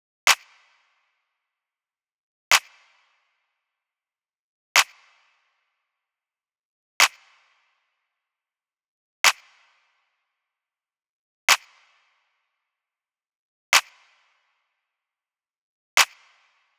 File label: Drum Loop - 107 BPM Snap; Loop Stems; Cymatics; Vibes II Drum Loop Collection; Voyage